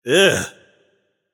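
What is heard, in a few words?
nonverbal ugh; smite; voicepack; merlin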